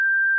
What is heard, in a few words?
bii